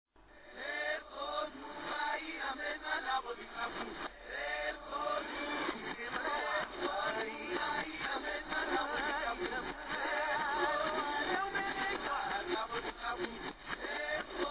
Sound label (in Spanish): reverse